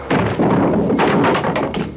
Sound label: Fight; 特酷声音素材库